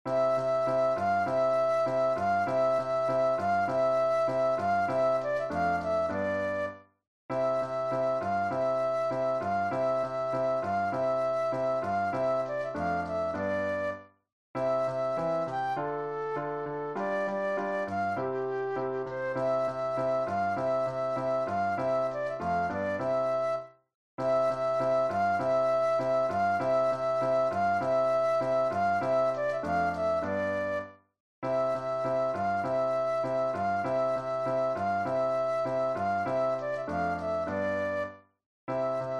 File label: Flute Solo; classical